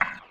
breakbeat

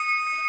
Ding D#5; 音MAD; 学园孤岛

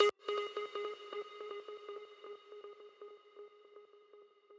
arp

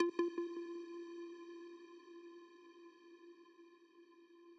arp

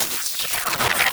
blast

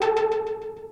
synth

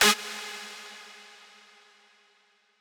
lead

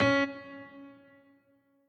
piano riff intro

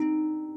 harp

